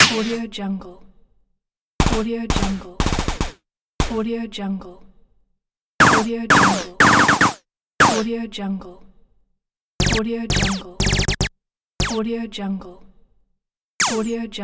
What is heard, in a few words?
scifi weapon sfx pack